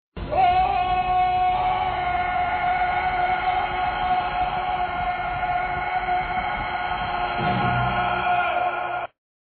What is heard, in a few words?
PREDATOR SCREAM